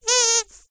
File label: leisure toy squeaky squeeze weak